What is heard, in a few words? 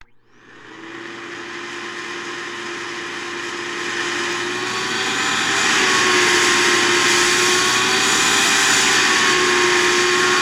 Vacuum Cleaner Constant; Home & Office; 1001 Sound Effects